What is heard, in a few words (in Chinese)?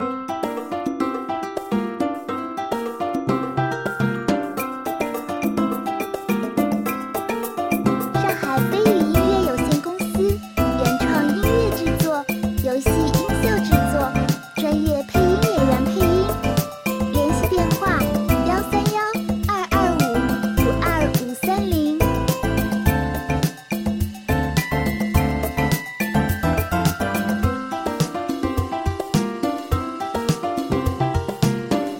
轻松休闲